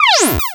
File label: Cry